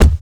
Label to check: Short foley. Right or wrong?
Right